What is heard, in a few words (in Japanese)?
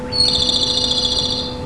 komadori; funagata